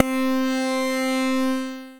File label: PWM tap release; Bass; Percussive; Ableton; Creative Extensions